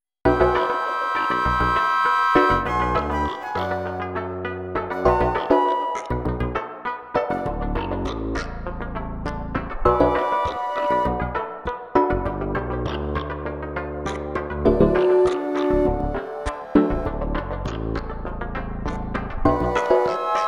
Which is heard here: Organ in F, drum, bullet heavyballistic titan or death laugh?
drum